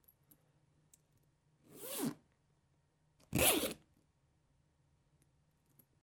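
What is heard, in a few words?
zipper fastener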